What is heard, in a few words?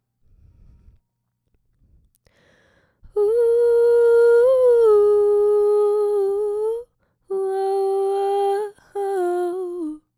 vox harmony